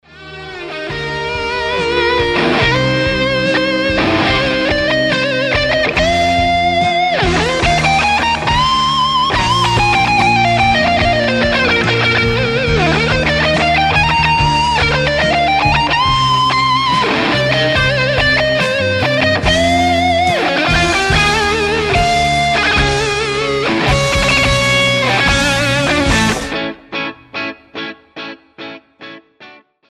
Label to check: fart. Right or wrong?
Wrong